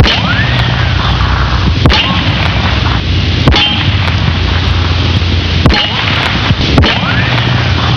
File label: pistol, gunshots